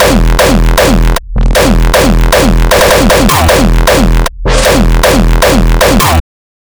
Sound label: kicks loop 155; GhostHack